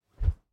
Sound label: Fighting Woosh; SoundsCrate